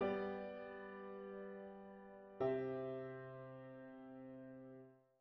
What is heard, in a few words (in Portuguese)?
Estudo de Harmonia acorde de sexta cadência perfeita